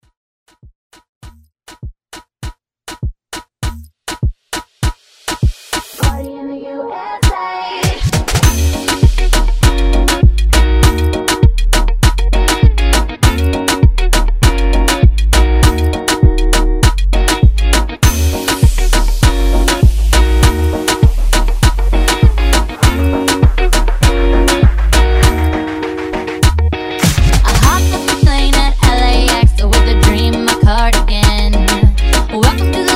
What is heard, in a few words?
Moombah Rework